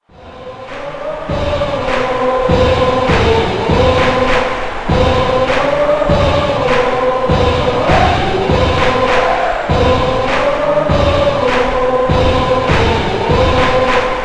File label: Chants; gamerip; Virtua Striker 4 Ver. 2006; Shinsekai